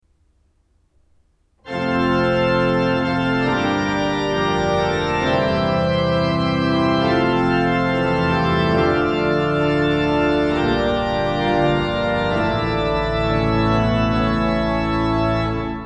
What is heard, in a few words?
Fanfare